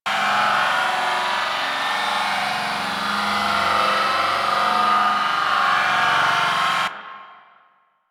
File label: Oxygen Pillar Loop; Survival